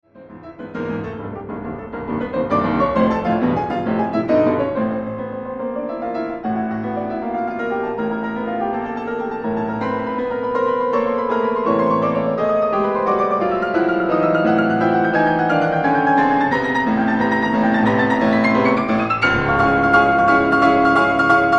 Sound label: Preludes for piano Allegro con fuoco